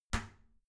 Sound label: toilet lid close soft; haus-garten; badezimmer